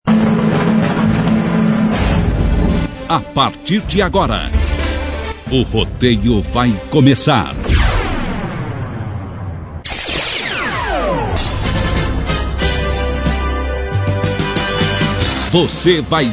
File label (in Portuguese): vinheta rodeio